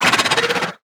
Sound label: HAMMERHEAD attack